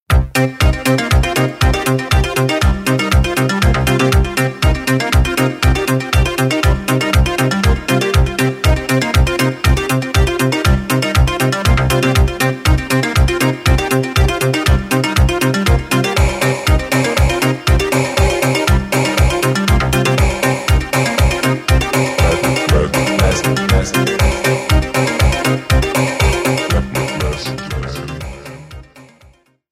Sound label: Instrumental Version